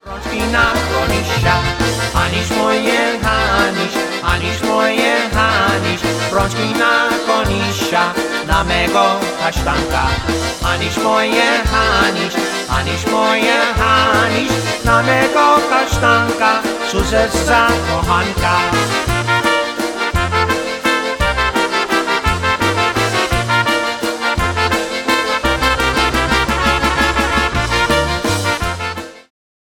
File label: Oberek